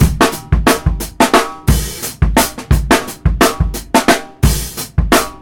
Drum Loops Funk, 88

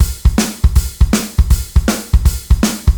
blues 60, drum loops

